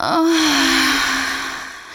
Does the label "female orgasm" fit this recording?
Yes